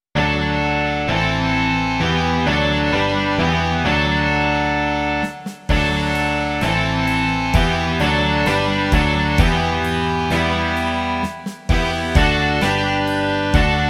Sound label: rock